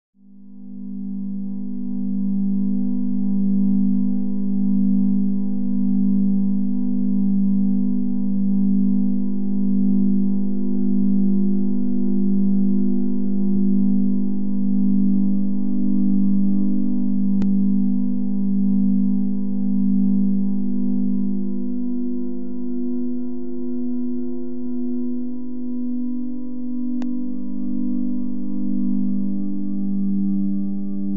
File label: ambient menu